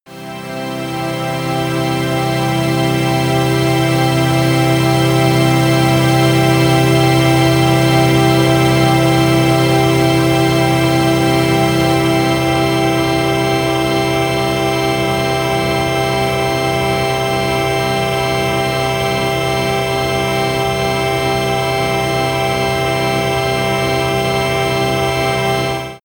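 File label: cathedral air, pads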